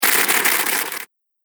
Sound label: Static; Ghost Sound Effects; Horror Sound Effect